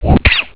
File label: HIT